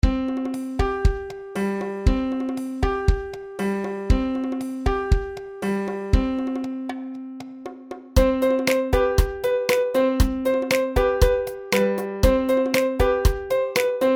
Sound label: piano beat